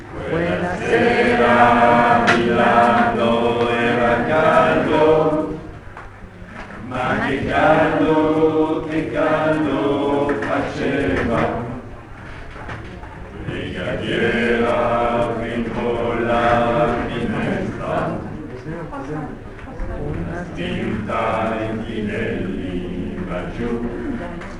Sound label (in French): voix basse; ballata per l'anarchico pinelli